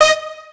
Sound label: Leed Melody